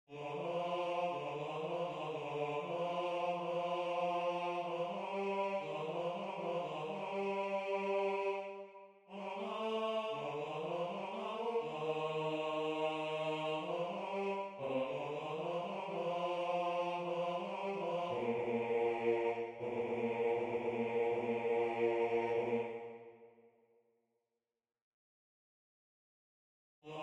la quete basse

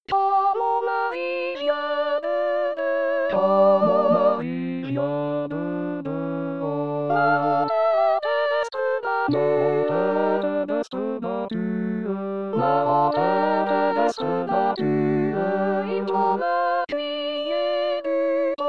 quand mon mary vient de dehors satb chante

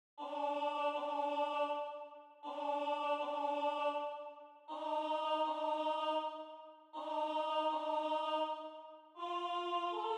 alto

